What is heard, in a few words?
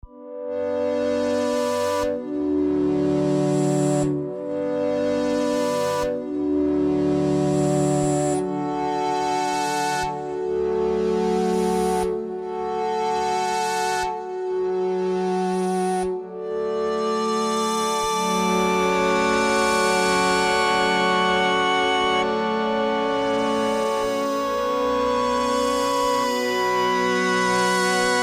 Bm 120 Korg chords